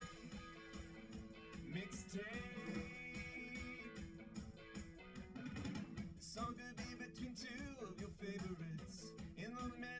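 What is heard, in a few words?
with drums live rec; SASSEC07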